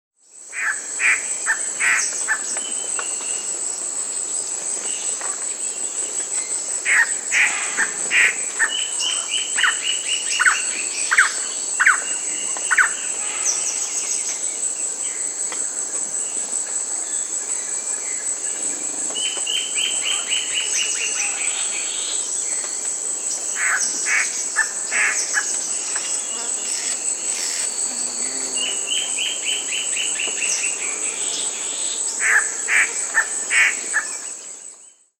Gray Antbird (Cercomacra cinerascens), Peru, Birds of the Amazon; S. America